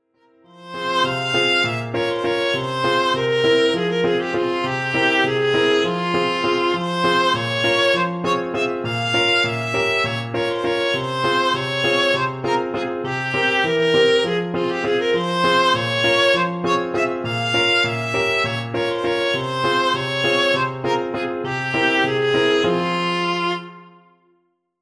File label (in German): suleikas tanz